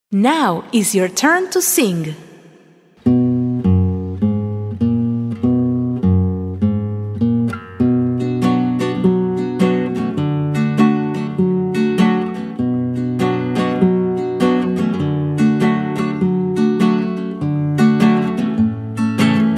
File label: The ants go marching KARAOKE